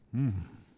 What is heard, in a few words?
Mmm Vox; Civ4 warlords